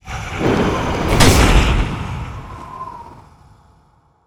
reaper boss; monster

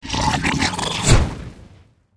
zombie attack, monster

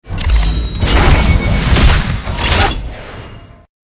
attack

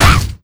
bolt damgup

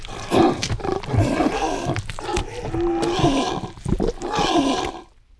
larva brown, monster

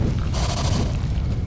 queen walk, monster

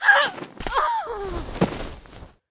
dark mage dead, monster

